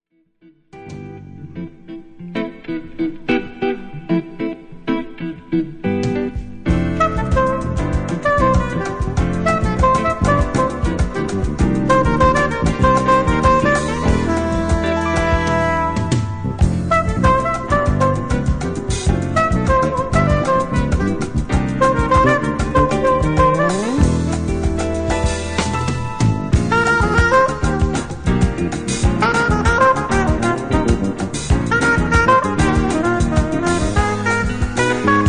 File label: Saxophone